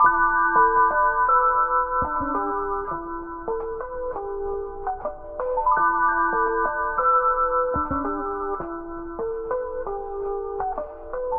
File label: keys, Phonky